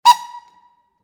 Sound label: train horn old